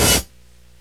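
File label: open hi hat, DRUMS + PERC; Rubber Chicken Software Sample Bank; EMU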